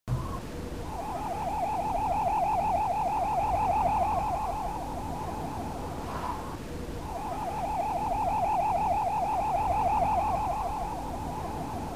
Ambient; The Bizarre Adventures of Woodruff and the Schnibble

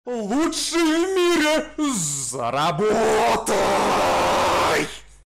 SFX Upgrade; Escape from Russia: Mobilization

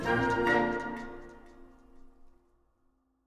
Music Slow Theme Bpm64 End; asterix obelix slap them all 2

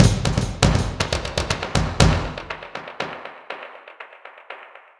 Combat one shot beat; Mushroom Men: The Spore Wars; Wii

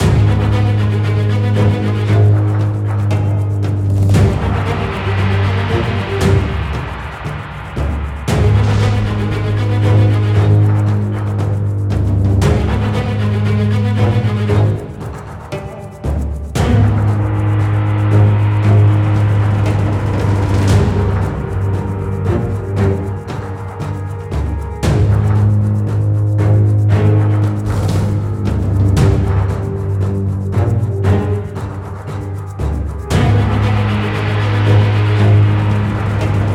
Fight; BIOMUTANT; FatherWolfFight level 2

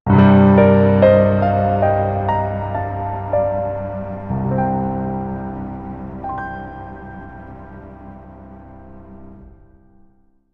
gameover; Afterlost Shoumetsu Toshi; nike

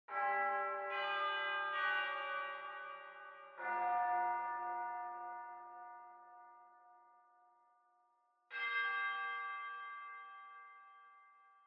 Bells; Five Nights at Wario's 4

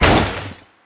Crash

